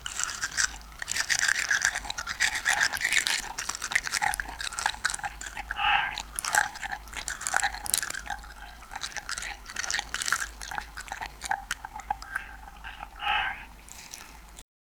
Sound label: grinding cocoa flowing ch, desserts